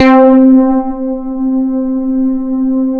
SH 1000, BASSES

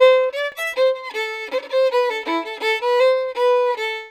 VIOLIN; USB Soundscan vol.42; Celtic Flavours; AKAI

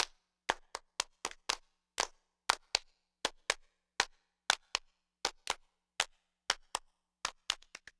Handclap Rhythms, Ukraine Gypsy; Sonic Foundry (Sony Creative Software); Continental Drift Aftershocks

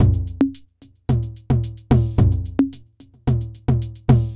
808 toms; Sonic Foundry (Sony Creative Software); Ugly Remnants VOL-2

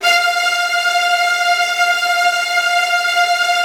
Tremolo strings; F2

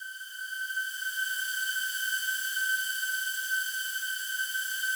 slow strings G5, Yamaha VP-1; 90 s Sample CDs; Music Store

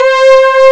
mt32 lead; Amiga Soundtracker Sample Packs

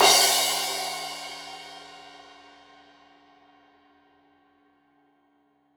Crash 15in L edge, Cymbals Stereo

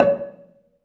bela C#4, belafon; USB Soundscan vol.60; AKAI

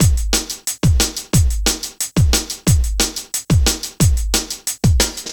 Cool Beat, Drum & Bass; Fluid Dynamics

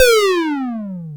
Internal Drums, Roland JD800

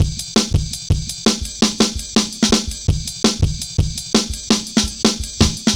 Jazz Ride A, Drum Loops, 166.5

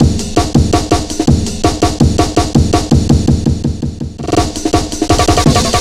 165, drum loops, drum & bass; Total Drum&Bass

